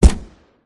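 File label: CAR15 shot, gunfire; Zomboid; VFE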